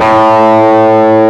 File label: A1, GUITAR; AKAI S-Series CD-ROM Sound Library VOL-8